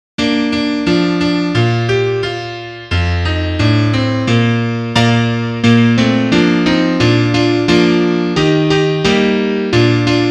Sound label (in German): Klavier Solo, trompete; Duett